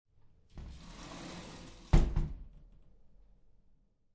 Shower Sliding Glass Door Open, Household, Shower, Sliding Glass Shower Door Open; Bathroom